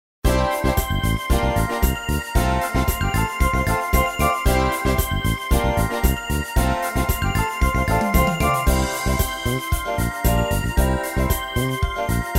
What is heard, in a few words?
Keyboard jogging Disco; pistas-piano